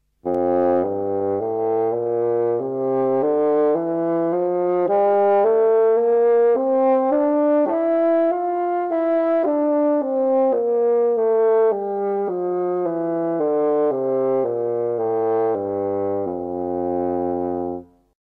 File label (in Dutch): Houtblazers Fagot laag hoog laag